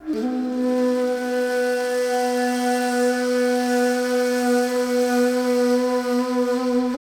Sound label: SIERRA FLU